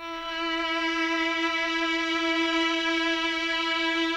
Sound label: CLN STG F3, CHAMB STR; Sonic Reality; Vintage Timetraveler